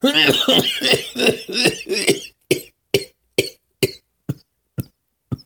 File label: old man cough